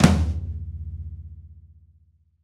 Rock Flam Tom, Roland BK-5

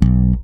SLP POP B, PEDULLA BASS; Masterbits CLIMAX 7 - Bass

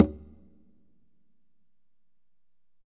Congas wet, drums percussion

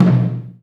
MED DRUM, JAPANESE PERCUSSION; AKAI S6000 CD-ROM - Volume 5; Ethic

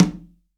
CONGA L; STEREO CONGA; Cuba

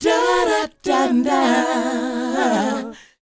DOWOP F#, Vocal R&B DOO WOP; ILIO; Vocal Planet

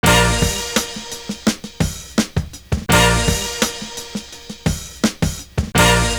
STAB N FUNK; Univers Sons

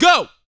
VOCAL, R&B Dance, HIP-HOP

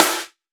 Elec Snare; Snares

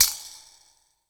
Pew; Zero-G; Total Drum Bass

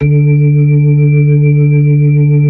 B-3 Chorus

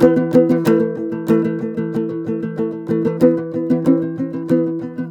TOPSHUR; Soundscan vol.60; Asian Traditions; AKAI

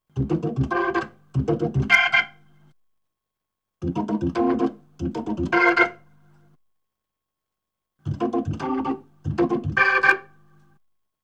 B3 Organ; Masterbits CLIMAX 8

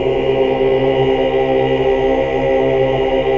OOH, Hallelujah

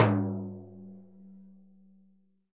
TIMBLO EDG, Synclavier Percussion; ILIO; Synclavier Percussion 2; World & Orchestral

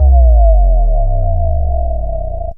Bass Lick, Drum & Bass; Fluid Dynamics

